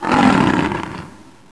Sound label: pain